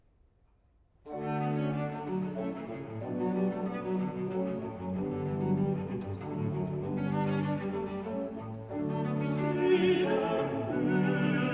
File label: Cantata, Aria (Alt, Tenor, Bass); BWV 150 'Nach dir, Herr, verlanget mich'; Bach; Arnstadt; 1st Sun After Easter